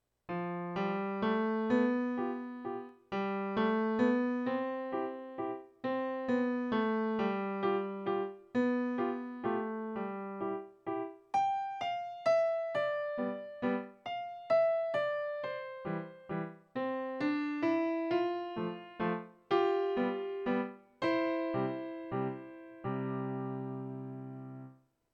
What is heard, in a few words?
Waltz